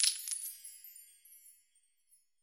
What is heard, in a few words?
Gold Spawn